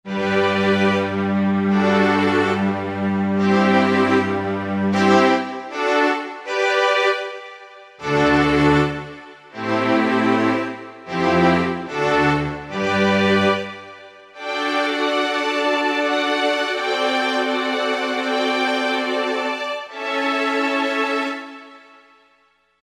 Tape Strings, Roland V-Synth; Factory Patches

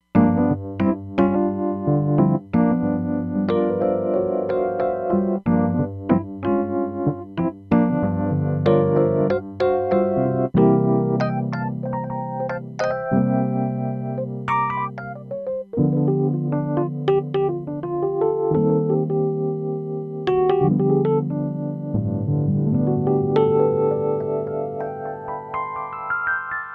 EP; Roland Fantom XR

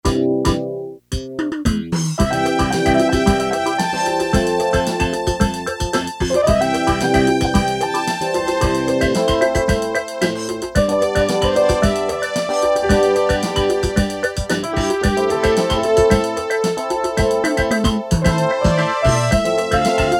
16 Beat, Casio CT-370, Rhythms

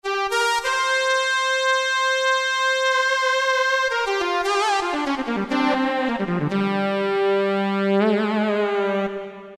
Pressure Me Lead, Roland D-50; Factory preset demos

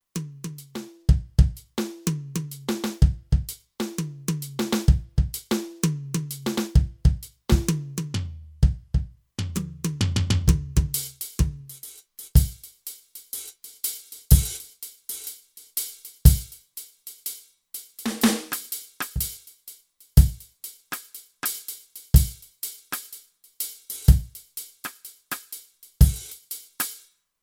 Reggae Kit, Kurzweil K2500X; Factory Programs

